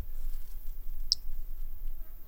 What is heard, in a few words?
Brachycephalus tridactylus; MR Bornschein